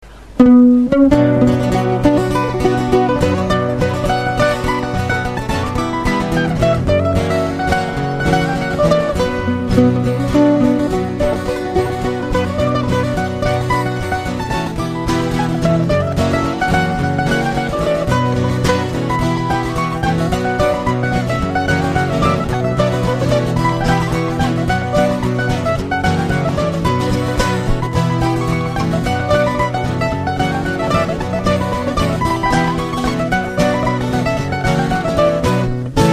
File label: Swedish reel